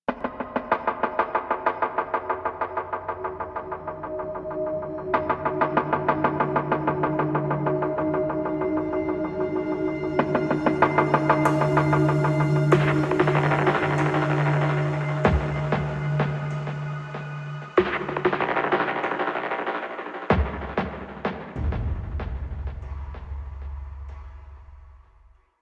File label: Amb Cue, Music; Warframe; DE; Hubs; Syndicate Perrin Sequence; Tenno